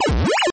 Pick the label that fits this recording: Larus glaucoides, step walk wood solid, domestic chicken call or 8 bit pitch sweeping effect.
8 bit pitch sweeping effect